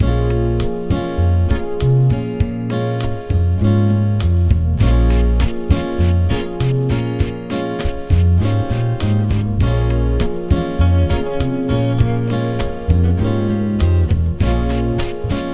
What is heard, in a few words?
BRAZIL, Bass Grooves; USB Soundscan vol.30; AKAI